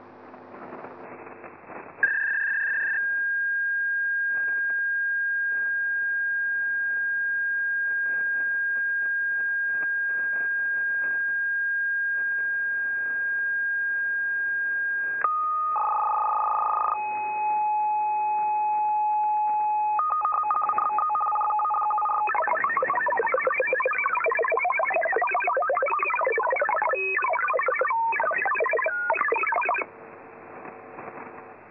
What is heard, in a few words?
AFS NY54, MFSK; Saab Grintek Communications; Saab Grintek MHF 50 robust MFSK waveform at 54.3 Bd with channel availability transmission